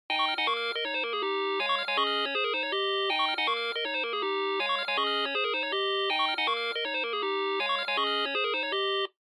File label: Bingo Machine Digital Shuffle Speaker